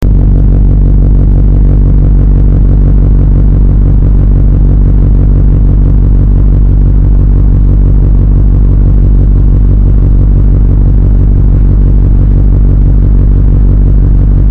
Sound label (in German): grundbeat